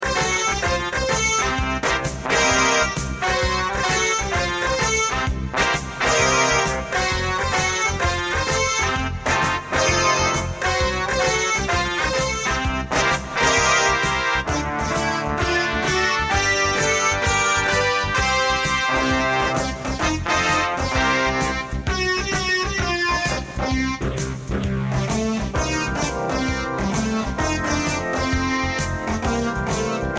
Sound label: loose tooth blues instrumental